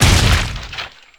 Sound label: Blood Splat Gib; Lucius III; pc linux